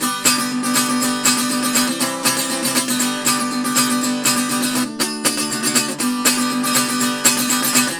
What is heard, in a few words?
Tenor Guitar, Appalachian; Sonic Foundry (Sony Creative Software); Continental Drift Aftershocks